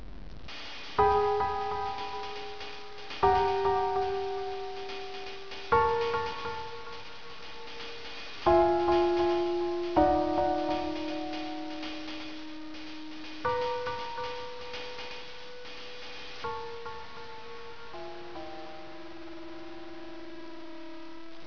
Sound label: Song